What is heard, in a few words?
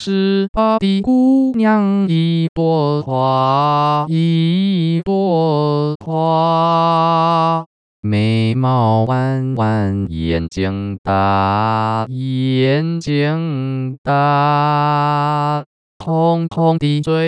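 Lady male, Mandarin Singing Voice Synthesis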